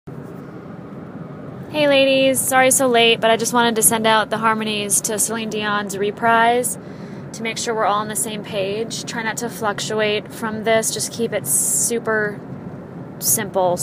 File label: Alto